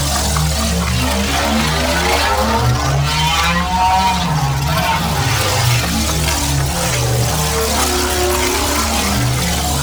Portal Loop; Enemies; Sentient Dolicholyst

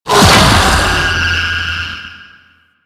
Triangle Arm Destroyed; Warframe; Man In The Wall

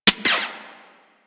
Sniper Mech; Warframe; Tenno

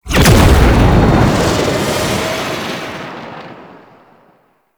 Void Fissure Open; Warframe; Entrati; Conquest

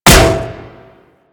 Staff Impact Metal

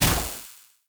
Casting Device Impact Default; Weapon Impacts; Player; Casting Devices; Ode Casting Device

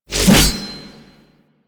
Hatchet Whoosh; Crp Venus Hatchet

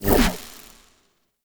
Experiment Pistol Shot Stop; Crp Sent Experimental Pistol

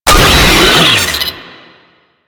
Infested Player Spotted; Enemies; Vocals; Warframe; Proto Infested; Brachiolyst

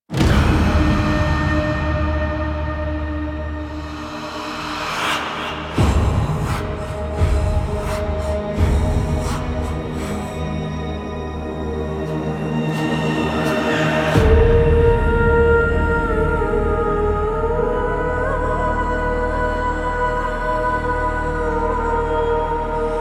Trailer Cue, Music; Khora Prime; Warframe; Povia Prime Market

